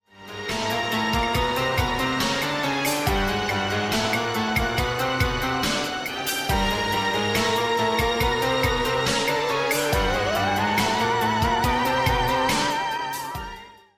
choose team; music kits custom

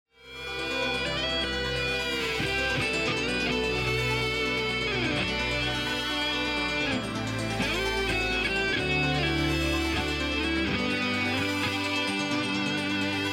lost round; music kits custom

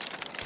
vandal inspect, weapons, rif ak47; prime vandal

